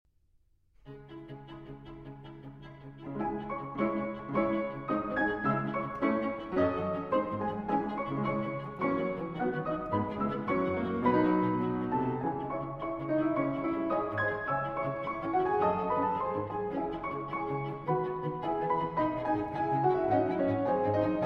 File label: Piano Trio in F Minor, Allegro Grazioso